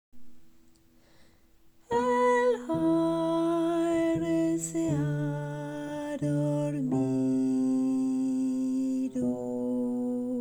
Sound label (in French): basse